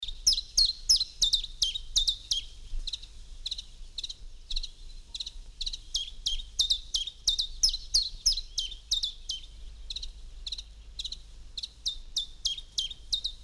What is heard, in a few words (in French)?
veloce, les pouillots